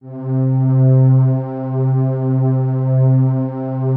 PAD, ATMO PADS; Best Service; XX Large

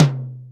R8 TOM

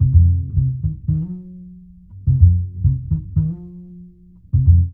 JAZZ BS; E-Lab; X Static Goldmine 2; akai

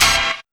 STAB; E-Lab; X Static Goldmine 1

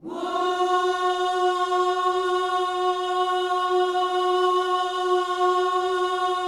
WHOO F#4, Vocal Planet Gospel; Spectrasonics; Vocal Planet Vol.1 Gospel; akai

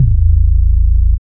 BASS

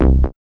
BASS; E-Lab; X Static Goldmine 1

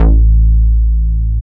BASS

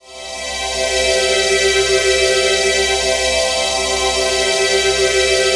PHASE PAD, ATMO PADS; Best Service; XX Large

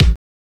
BD; E-Lab; X Static Goldmine 1

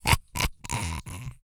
GRUNT

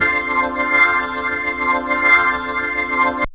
ORG CHD; ORGAN; E-Lab; X Static Goldmine 2; akai

